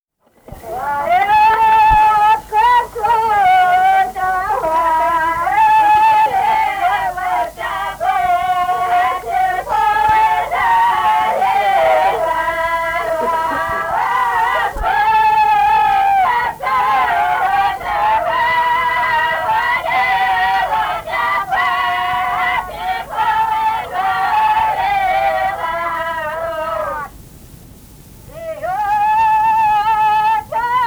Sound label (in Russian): Под косой трава валилася (городская); Песочня